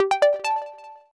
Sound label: Online Message